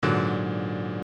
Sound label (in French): Abm7 5b, guitare